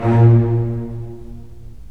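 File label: STR, strings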